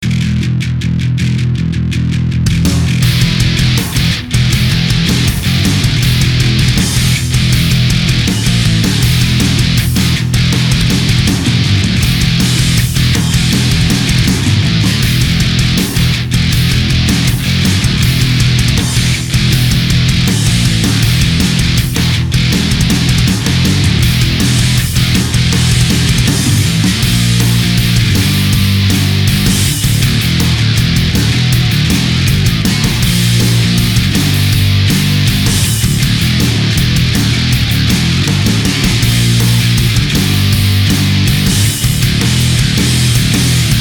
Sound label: Basic Distortion Run; Voyage 3 Outlaw; Android